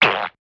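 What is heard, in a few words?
Cartoon Farts High Pitch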